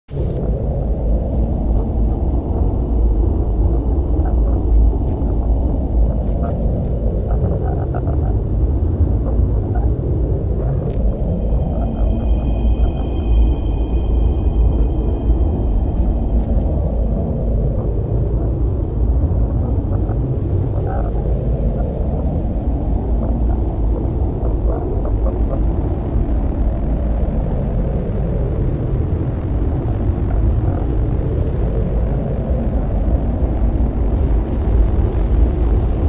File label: Carrier Leviathan interference loop; Barotrauma; Barotraumatic